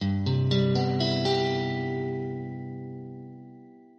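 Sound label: Cmaj, Guitar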